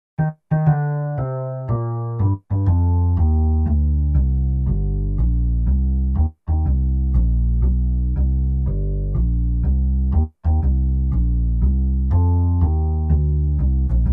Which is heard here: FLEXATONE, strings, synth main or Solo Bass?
Solo Bass